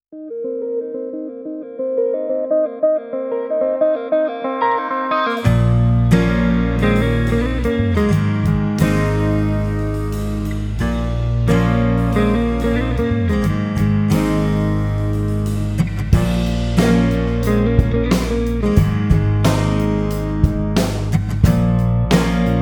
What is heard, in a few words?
instrumental